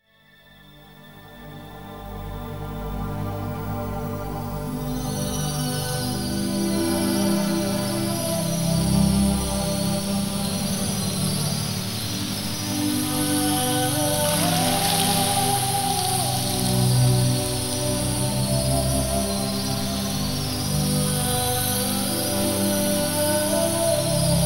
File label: Fountain score; Boxing Helena